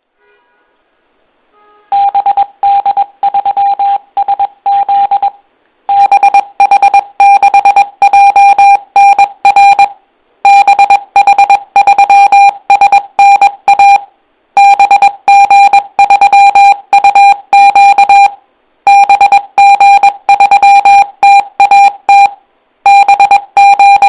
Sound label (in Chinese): CW 铃声; 业余无线电